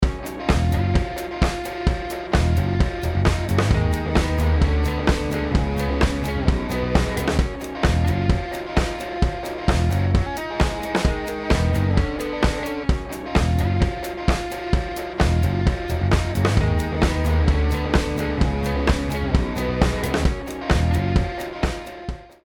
Full Band

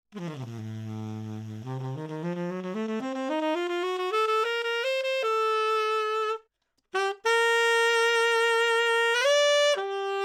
Sax Tenor 20cm Mid

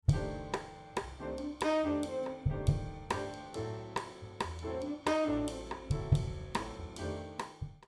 Snare Meld

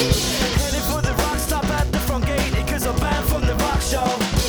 Vox Balance Comp No Auto

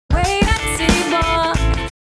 Vocal Sample Kick Cancel

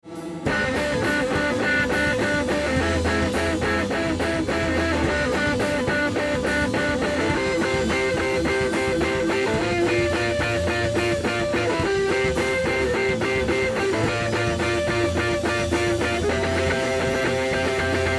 Baffles Guitar

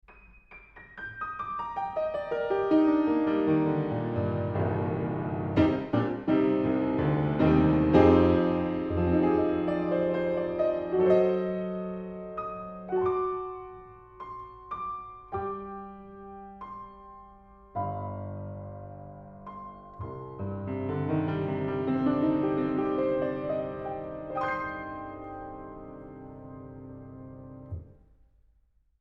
Piano, Spaced Omnis 40cm

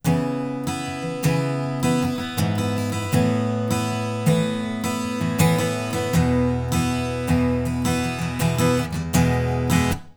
Ac Gtr

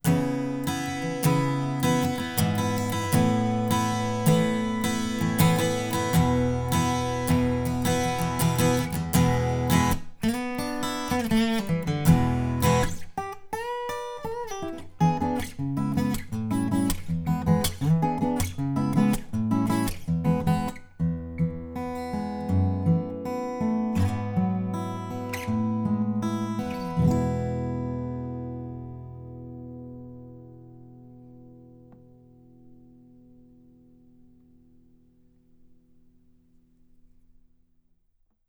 Ac Gtr

